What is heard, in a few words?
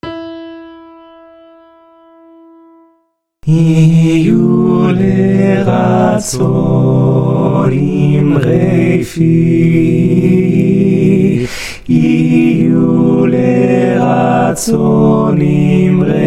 allvox; yihyu lratzon